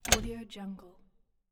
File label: warehouse door handle